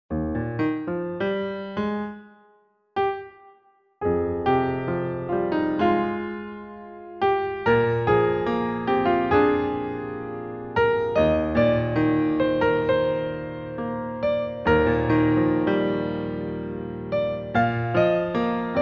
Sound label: casablanca piano